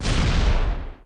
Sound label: explosion small